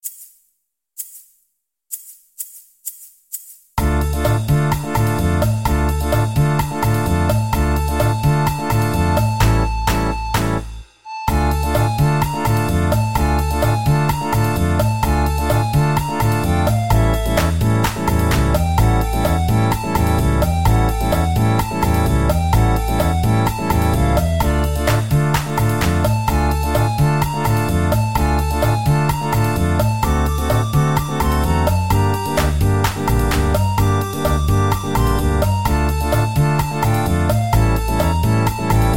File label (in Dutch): Muziek uit Oude Rommel Backing Track